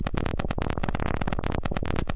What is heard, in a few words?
farty blobble fx; Bleeps Blips Blonks Blarts and Zaps